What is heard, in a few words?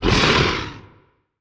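boss damage up; krasio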